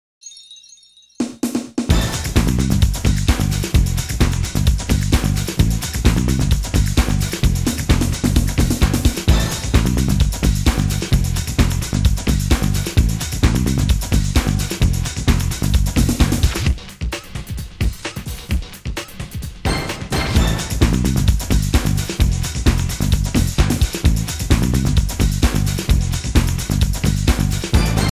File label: House Groove